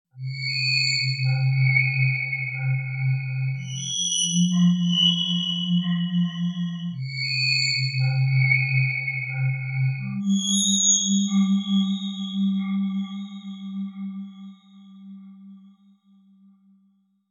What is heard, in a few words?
Alien Calling Note Example